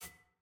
UI Menu Generic Hover

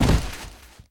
ground bounce; bloom minigame

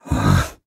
Nonverbal Annoyed; Ornn

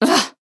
Nonverbal Annoyed; Aurora

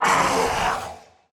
Enemy Death; bloom minigame

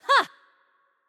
Attack Light; bloom minigame

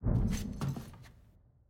Lock Exit; Puzzle

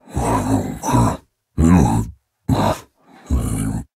Nonverbal Grumble; Ornn

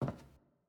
Footstep Wood

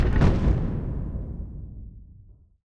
button click leave game

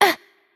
Hurt Light, ro RO; bloom minigame

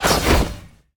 Attack Light; bloom minigame

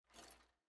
Foley Buckle

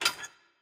Monkey Screw; Puzzle

